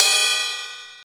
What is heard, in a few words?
Ride, Yamaha SY22